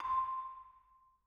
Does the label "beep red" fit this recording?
No